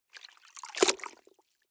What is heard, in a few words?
clothes water updown short hard, haushalt; haus garten